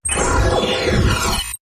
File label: Turbulent Energy Sweep